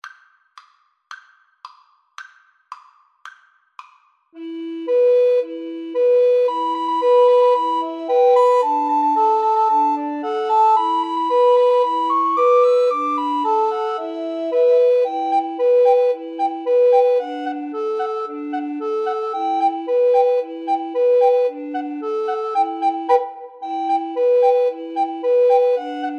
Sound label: drunken sailor, Recorder Trio